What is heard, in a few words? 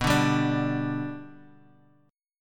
B Minor Sharp 5th B